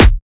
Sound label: Kick, dubstep; Demolition - Dubstep Samples; Drum Shots; Apocalyptic Kick